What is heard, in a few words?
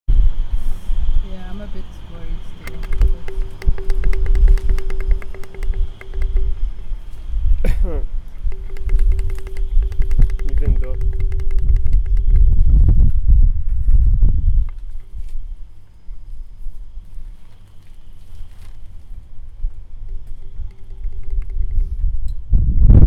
underwater, Hydrophones